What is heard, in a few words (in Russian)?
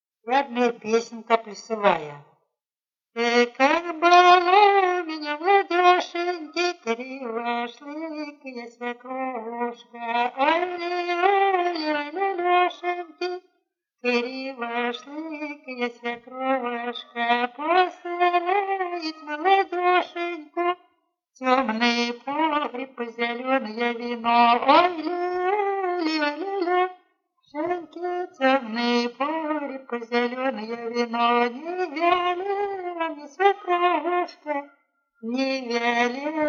колядная хороводная; Эй, как была у меня, младёшеньки